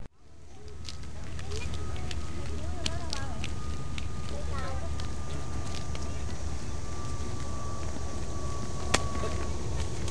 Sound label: vent